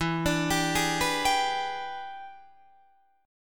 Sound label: E 7th Sharp 9th Guitar Open D, chord